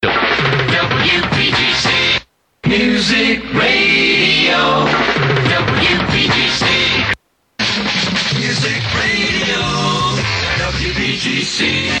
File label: jingles montage